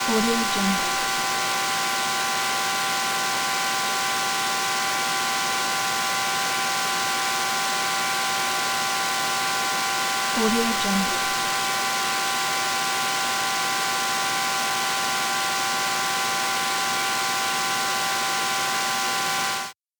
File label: data hum noise